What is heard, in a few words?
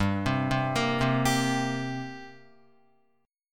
G-Minor Double Flat 5th G down Guitar; arpeggiated; JGuitar